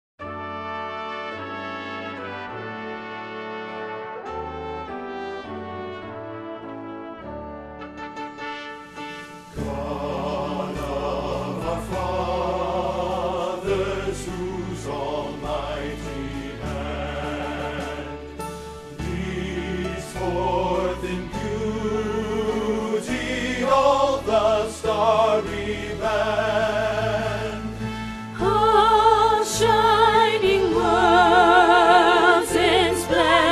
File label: Alto, God of Our Fathers, Choir Rehearsal Parts